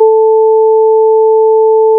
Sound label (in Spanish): a4 flauta cutoff 1000Hz